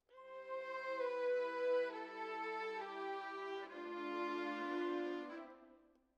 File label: Violins, jazz for piano